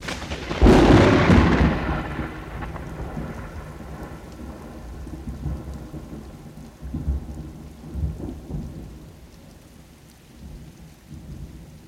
Thunder Crack Pre, thunder

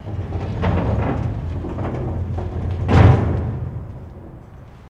Jail Door Roll Open; jail & prison doors

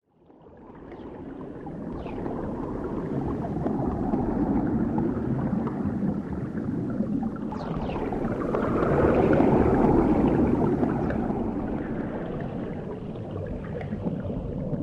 Underwater Movemen

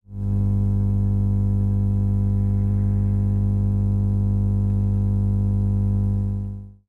Electricy Buzz Heavy, buzz & hums

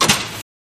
Defense Grid Door Lo, doors

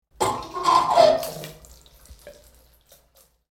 Creaky Water Faucet Squeaks And Drips; Sink

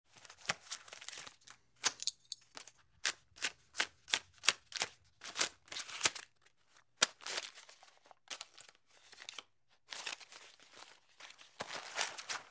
Money Counting, money

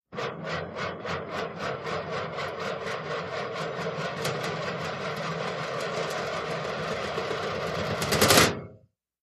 Auto Hubcap Spins; VEHICLE -ACCESSORIES AUTOMOBILES

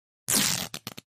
Fabric Tear Rip

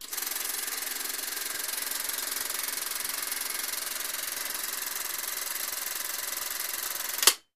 16mm Video Camera; movie & projectors

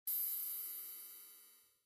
Button Sci Fi Beep T, buttons & beeps

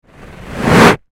Quick Whoosh Flying, whooshes

